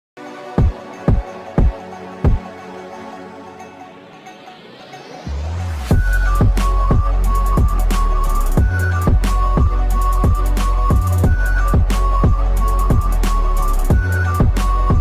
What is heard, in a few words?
instrumental ringtone new remix ringtone